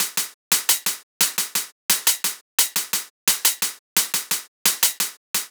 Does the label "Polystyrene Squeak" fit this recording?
No